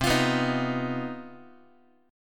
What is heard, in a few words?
C Minor Major 11th, Guitar; CmM11 strummed; JGuitar